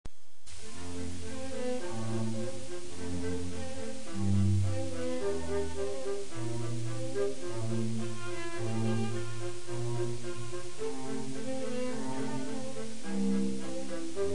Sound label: cello viola; mozart; musica classica